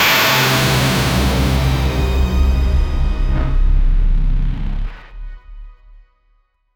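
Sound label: aggressive cyberpunk synth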